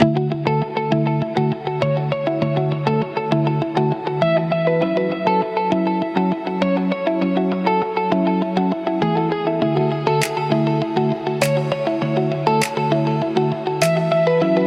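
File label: office routine, dlya ofisa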